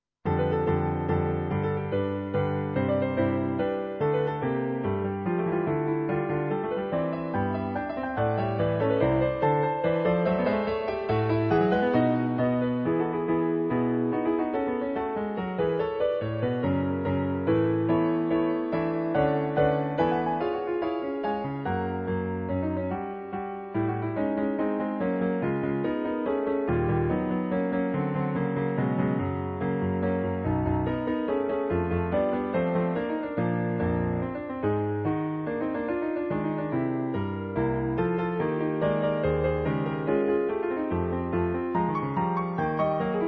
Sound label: Piano folk; Allegretto impettito, un po' agreste